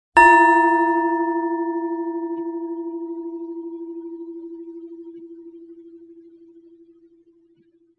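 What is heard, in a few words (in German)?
Planetenschale inkl. Klöppel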